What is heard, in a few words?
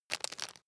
bone break midsize